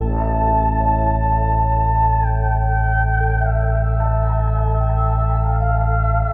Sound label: keys, Loops, Sad